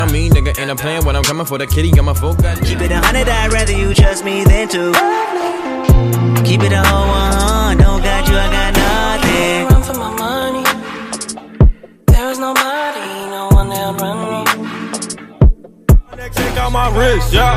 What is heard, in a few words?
new hip hop ringtone